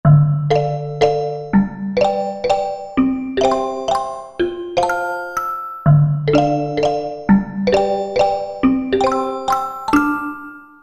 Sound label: Tinklepno, Percussion